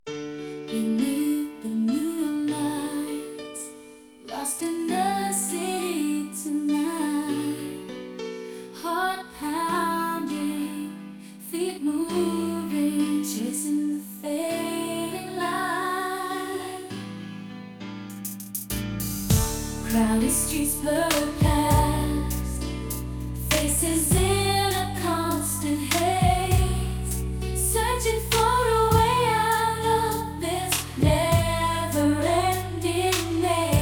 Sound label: Serenades of Electronic Waves